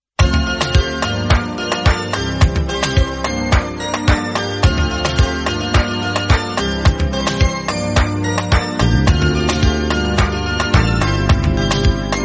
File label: instrumental bgm